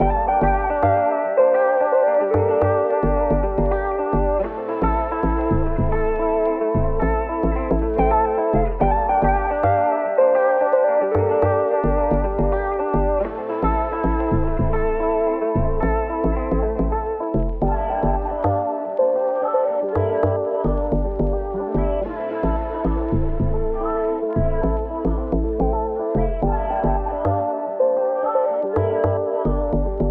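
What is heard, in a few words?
109 BPM D Minor, Melodies, Afrobeats; Bembe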